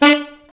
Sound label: SAX A. STAB, Alto Section; Alto Sect FX; Roland L-CD702